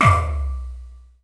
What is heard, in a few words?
Kick, Korg Z1